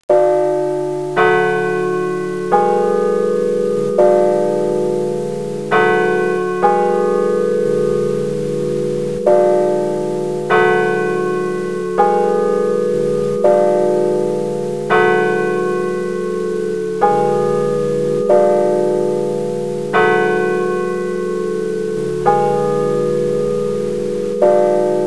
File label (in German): glocken gelaeut zellerfeld reihenfolge; Geläut Original Tonhöhe; Marienkirche